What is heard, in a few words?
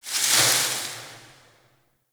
Tower Branch Disturbance; The New War; Ambience; Cetus; Unum Tower